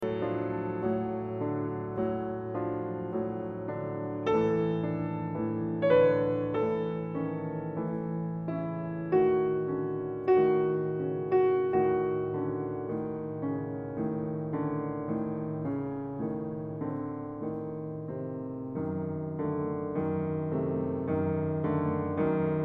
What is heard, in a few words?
Preludes Op.28 No.2 A Minor